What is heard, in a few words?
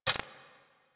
Briefcase Akimbo Pistol Mech Low Clip; Warframe; Weapons; Corpus